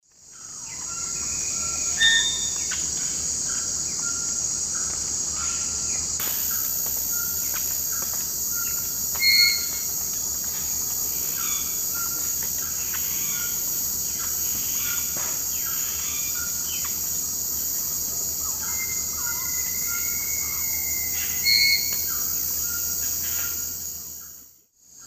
Pionites melanocephalus, calls; S. America; Eastern Lowlands

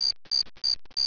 CRICKET

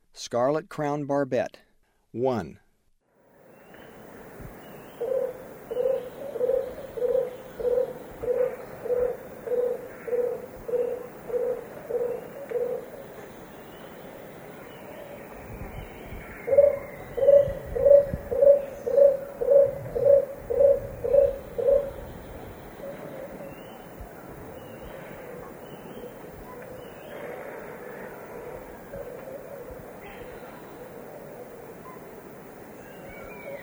Scarlet-crowned Barbet; S. America; Eastern Lowlands

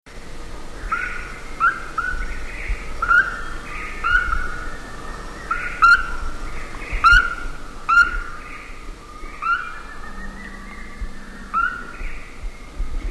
Short-tailed Nighthawk; S. America

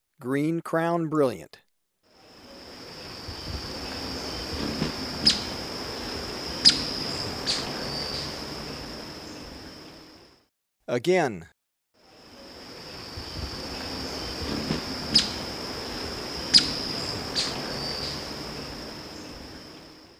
Green-crowned Brilliant; S. America

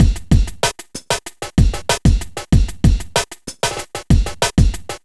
kit; Sound Effect Library

